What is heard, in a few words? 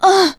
hurt